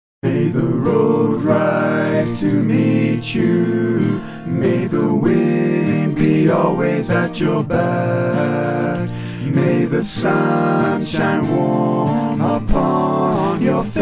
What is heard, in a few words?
An Irish Blessing - satb; classical-traditional